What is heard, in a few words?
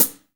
HH hh; Best Service Gigapack 2